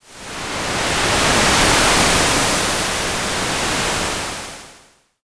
wave

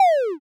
cast cancel

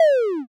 cast cancel

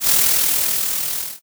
frying

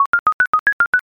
processing

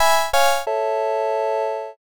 ending

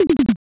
drum fill

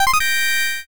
join

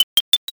nail

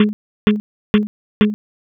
fs flooring

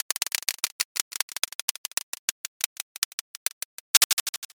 roulette

